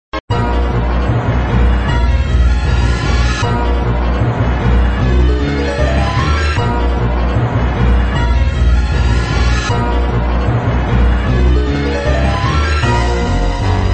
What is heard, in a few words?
Music, flipper